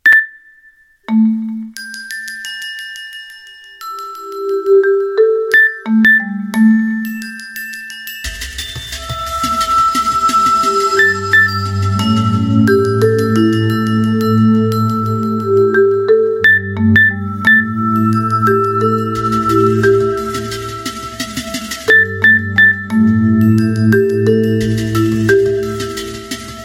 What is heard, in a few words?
nokia clock